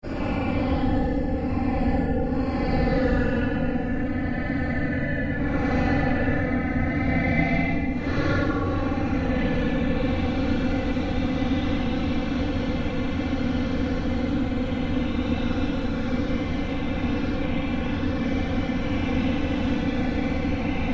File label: Created Drone Short, Drone Sound Effects; sound building blocks created through manipulation of raw material